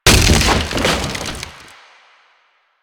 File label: Wood Bench Destroy; Warframe; Ambience; Nineteen Ninety Nine